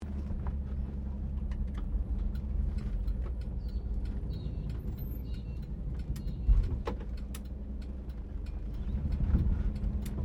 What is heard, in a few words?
CAR HOLDEN FE INTERIOR TURNING SLOW, Classic Australian cars; Vehicles; SoundEffects-Foundation1